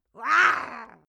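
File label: female aggressive, screams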